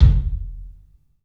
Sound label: KIK, LIVE CLUB KK, Drums; 3D Sound